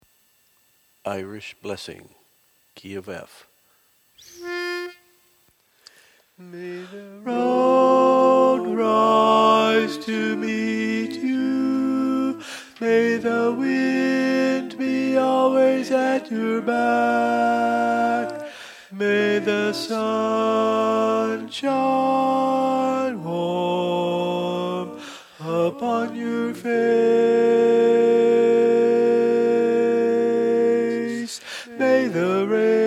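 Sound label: Baritone, Learning Tracks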